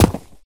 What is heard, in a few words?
footstep concrete land; ProSoundCollection v1 3 MONO